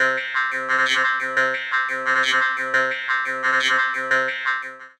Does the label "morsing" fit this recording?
Yes